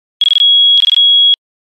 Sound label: Drill, Nokia N95 Digital